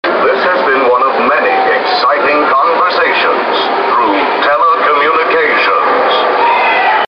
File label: phonebooth